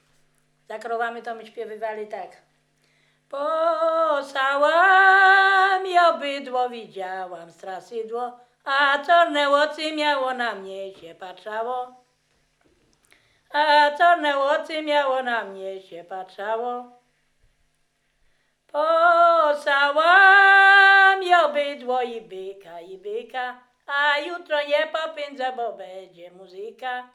przyśpiewki łąka

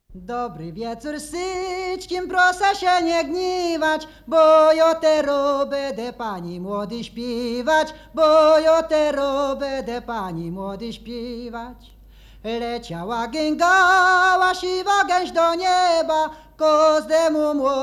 Powitalna pieśń weselna solo

